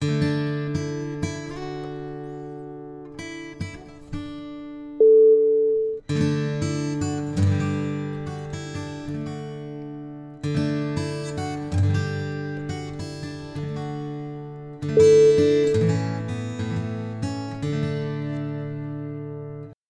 highland sweet

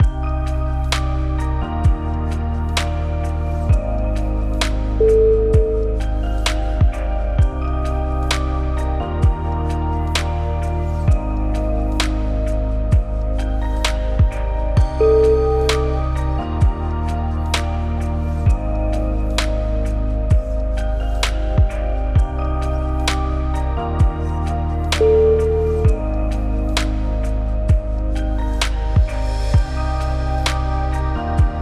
warm breath

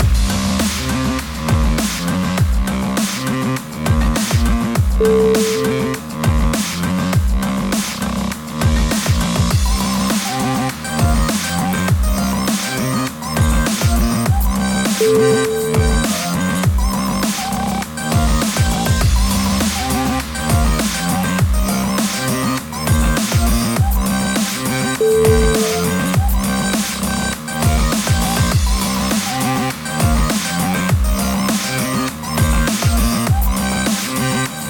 pump the bass

